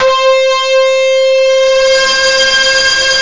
dirt guitar high

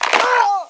Minimize; 250 Desktop Themes; Quake

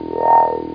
locator

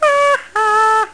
ha ha; The Fishin' Hole v1.0

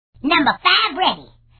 sound ready; operation graduates

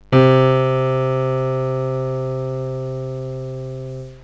monotone

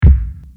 Back Wall, bowl; bowl blast

